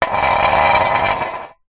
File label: drink can empty drag concrete ground